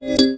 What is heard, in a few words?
alert